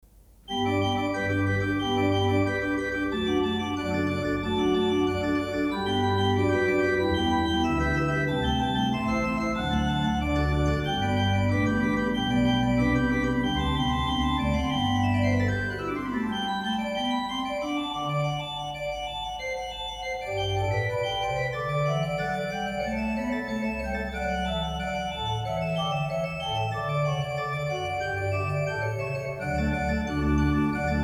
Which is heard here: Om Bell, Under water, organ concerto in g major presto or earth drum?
organ concerto in g major presto